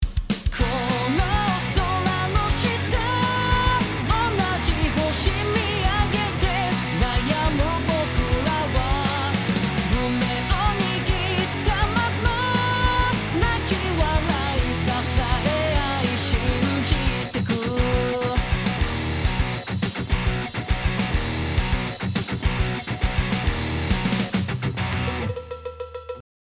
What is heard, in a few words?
master Off